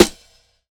subby snare drum a sharp key, Loudest frequency: 1834Hz